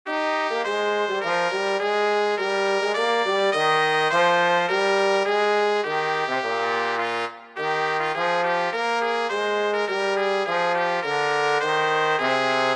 Brass for 2 3 trumpet and trombone

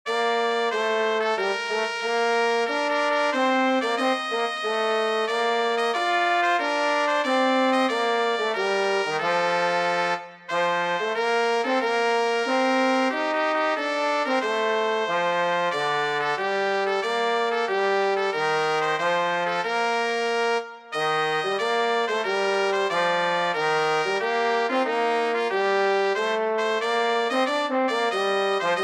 Brass for 2 3 trumpet and trombone

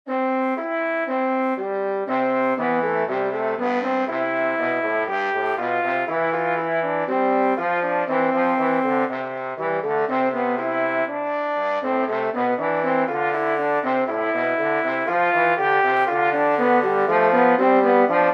Canon for two trombones

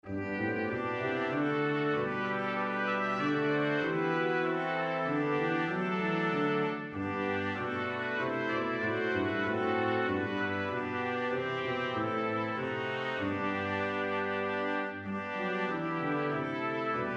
Brass Quintet; Nu triomfeert de Zoon van God

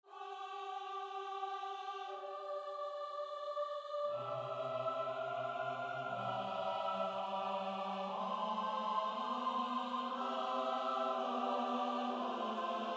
Motet; Psalm 14

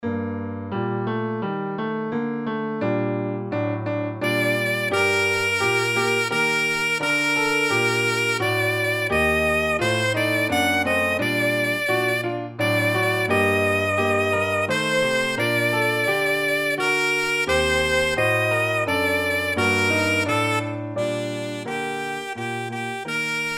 Alto Saxophone and piano

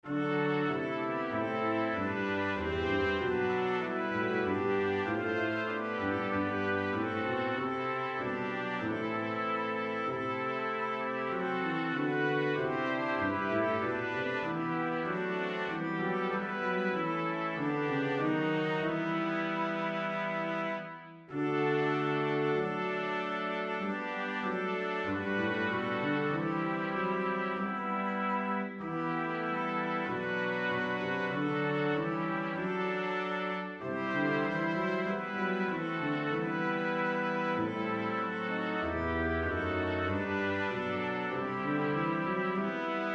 Brass Quintet; Liedboek; Ik ben in mijn hof gekomen; kwintetten

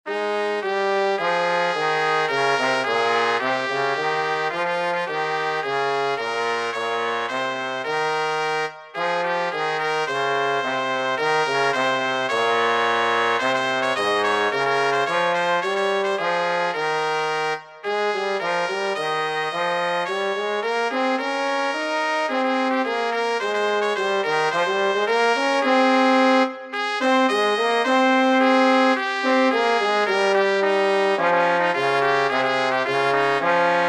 Brass for 2 3 trumpet and trombone

